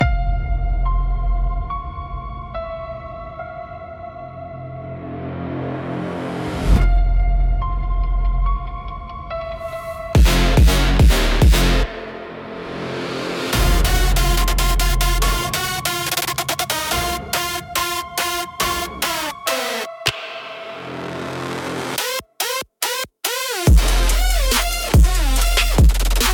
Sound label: Shatter Pulse, aggressive panic for direct fear and jump